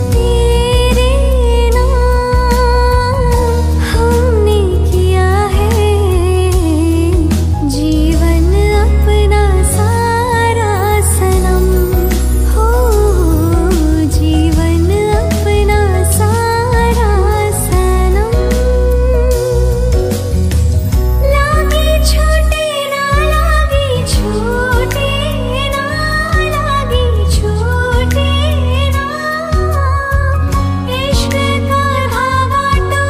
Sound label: Female Ringtone